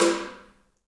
Kenyan Drum; Roland LCDP14 Africa VOL-2; Af.Hand Drm1

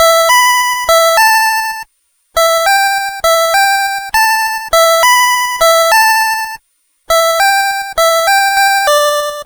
Game Boy Synth

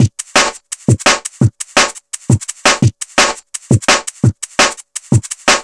Drumloops, 170bpm; Zero-G; Total Drum Bass

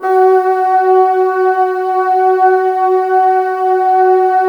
Bassoons F#4; Miroslav Vitous - Woodwind Brass Ensemble

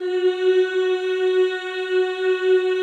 CHO F#3, Aaahh Yhh; Sound & Vision - Gigapack I CD 2 (Roland)

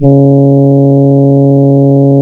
C#, Synclav FM, BRASS; Big Fish Audio

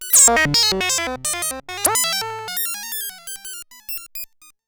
Random Compute

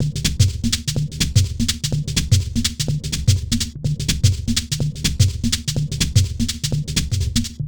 Sh Fx Loop 125; Hh-Shake Loops 125; Equipped Music; Loungin House

